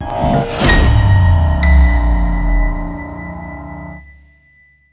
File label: skill pianohit